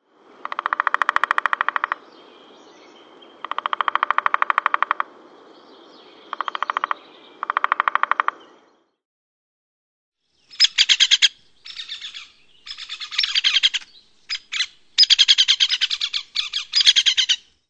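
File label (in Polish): dzięcioł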